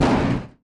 car metal large impact; factorio 2.0.55

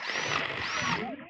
robot repair; factorio 2.0.55

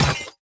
car glass impact; factorio 2.0.55

